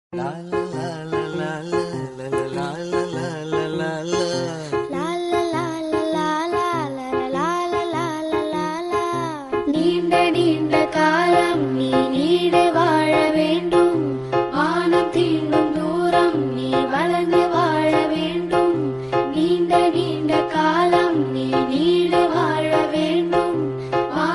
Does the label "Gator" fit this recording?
No